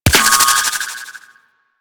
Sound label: Gremlin Shot, weapons; DE; Warframe; Sentinel